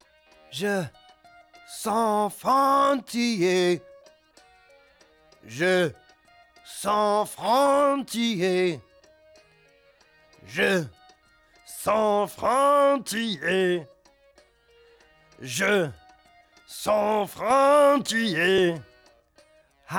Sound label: Main Vocal Intro